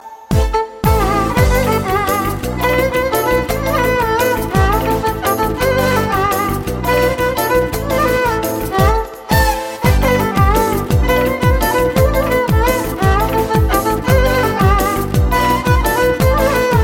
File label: Kurdish Dance